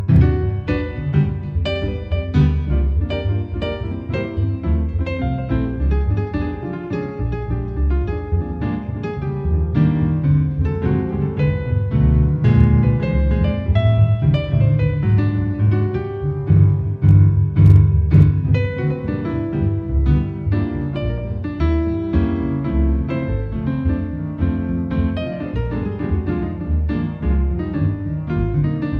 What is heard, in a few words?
Jazz piano